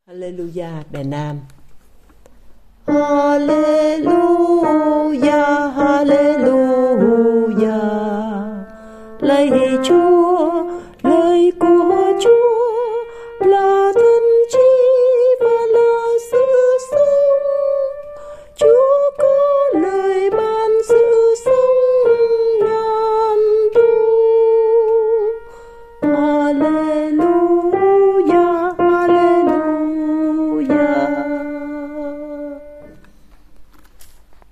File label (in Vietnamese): Alleluja nam